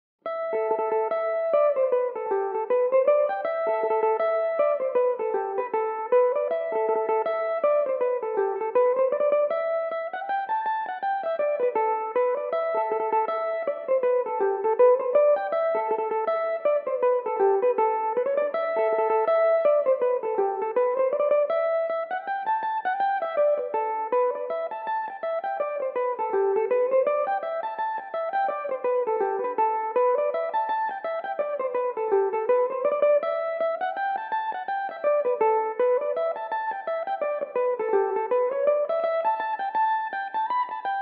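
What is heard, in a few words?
A dorian belmuse, irish mandolin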